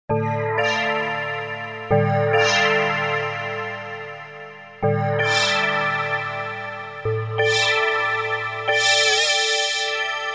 Bell: filtered, Emu Xtreme Lead-1

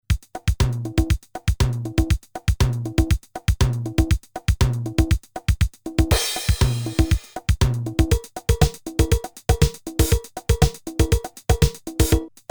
samba, roland cr1000; samba 1 & 2 pattern; internal tempo range from 40 to 240 BPM

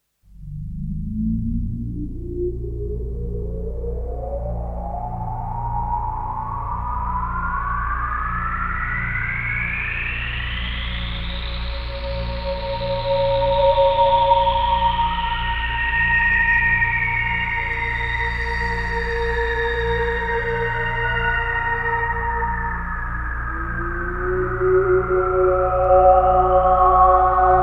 pad rez, yamaha sy99; Pad: resonance LPF; filter 1 is set to lowpass mode; synth

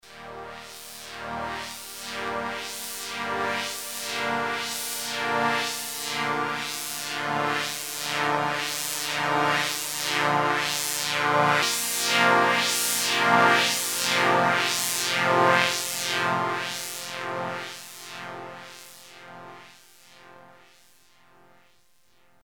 pad lfo, redsound darkstar xp2

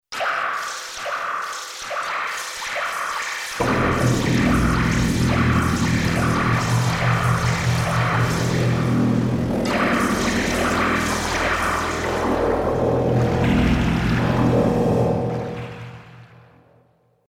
water evil, yamaha tg33

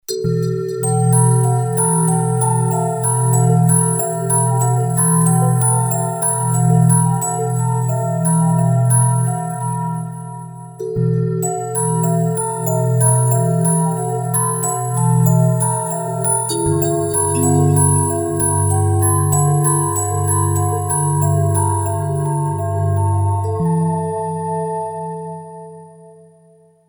synth crazy, roland jd800

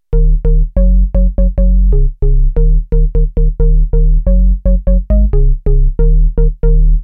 house, Novation Bass Station II; old house organ; analog monophonic machine with subtractive synthesis

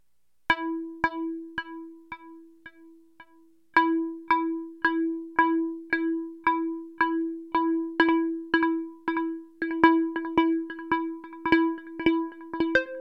delay modes, access virus; synth

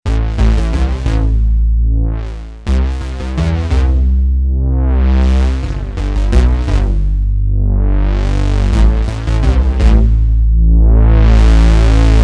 korg m1r synth; favourite : precint bass; cpu NEC-V50 (part of 70216 family) manages 16 voices polyphony

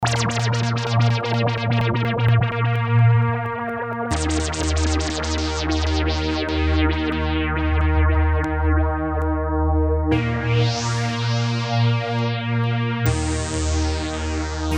synth; Electrix Filter Factory

